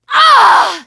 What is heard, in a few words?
Wound Crit; Sif